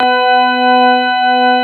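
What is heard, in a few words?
CX3 organ B3 L, Keyboards Old School; USB Soundscan vol.09; AKAI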